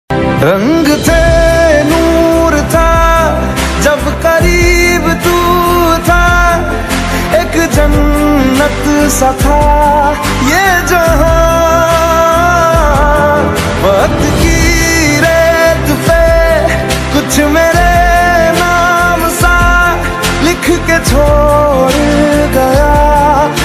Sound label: ringtone new trending ringtone new panjabi ringtone